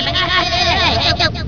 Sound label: moo